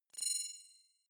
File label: sci-fi external break; maschinen; signale; soundpool